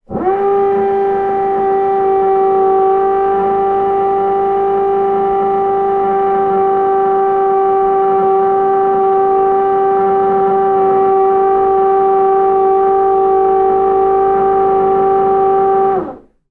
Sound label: industrial drilling wall floor below